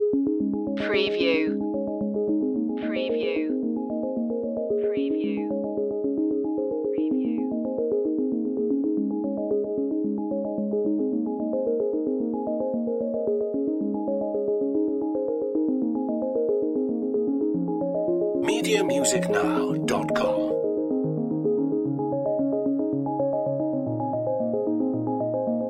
clear loop

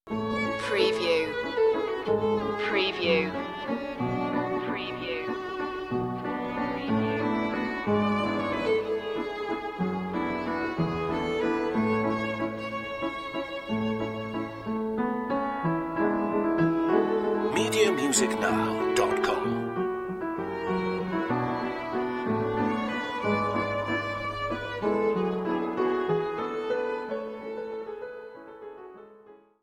old waltz

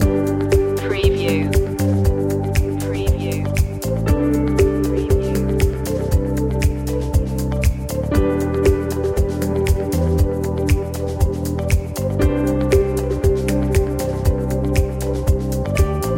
corporate skies loop

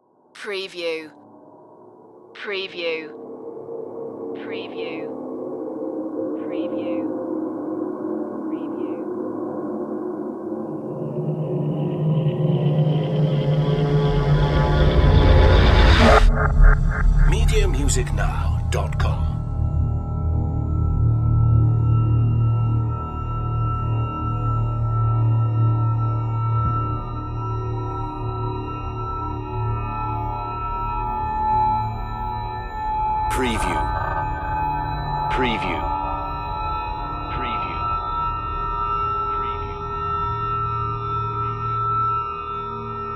dark ambience

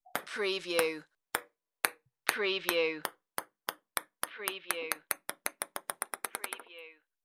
Ping Pong Bounce

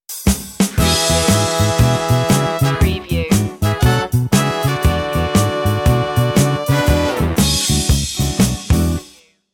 blue stinger

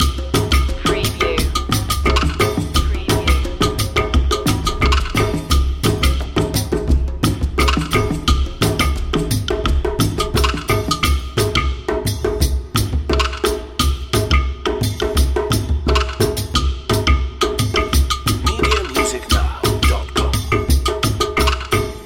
outback groove loop

